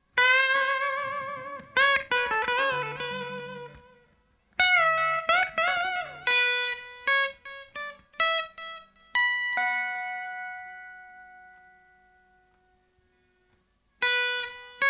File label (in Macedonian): Ehoreverb gitara; EMITER